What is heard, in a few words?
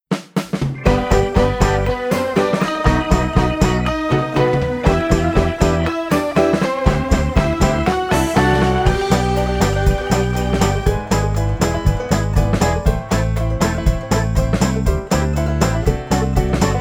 Karaoke